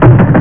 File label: WOOD